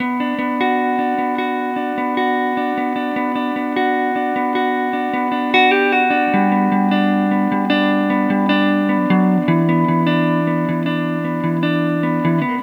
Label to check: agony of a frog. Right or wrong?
Wrong